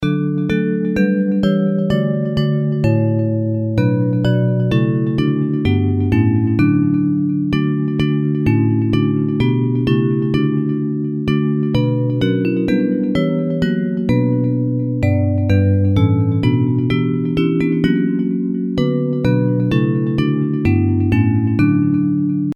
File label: Bells; Hymns